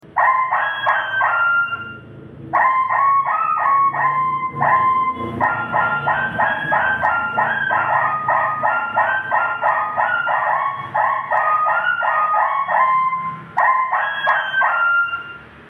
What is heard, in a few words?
alarm rem sulawesi full